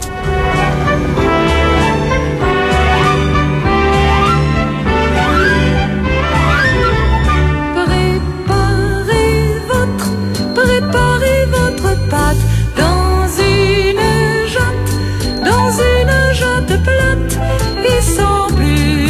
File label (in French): Chanson recette de cuisine